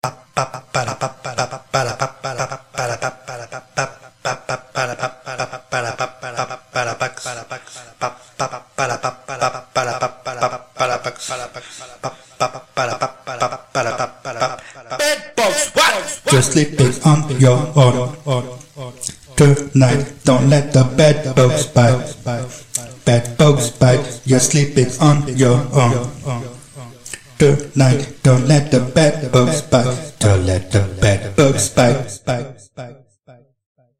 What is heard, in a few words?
rnb 120 Em, Acapellas